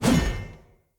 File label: Sphere Polearm Heavy Whoosh D, Weapons Player